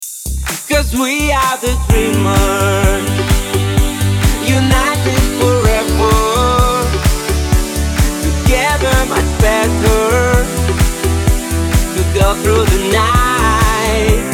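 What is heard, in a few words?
Tuned Percussion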